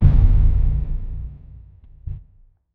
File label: primal critical success, tribal